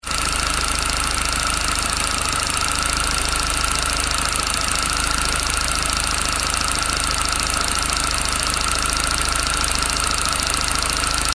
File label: r53 engine noise; 2003 indi blue mcs